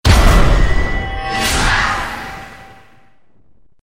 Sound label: Load Character; monster hunter